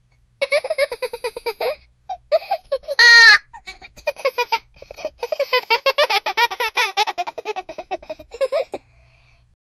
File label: jokingly lough child